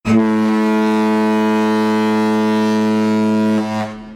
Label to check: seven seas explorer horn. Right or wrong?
Right